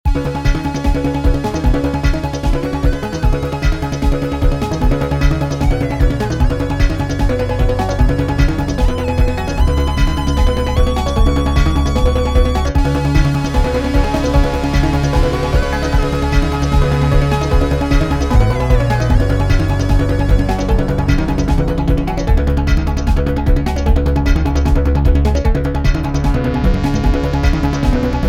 menu selection loopable faster; Ballpoint Universe Infinite

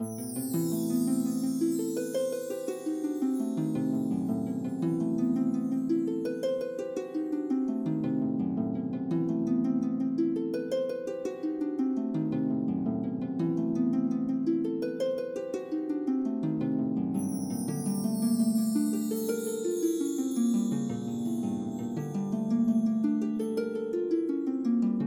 Harp; Temple; tintin reporter cigars of the pharaoh

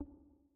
Outro Blink; City SFX

